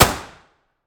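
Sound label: weapon dmr sup fire player atmo int; gmod; viper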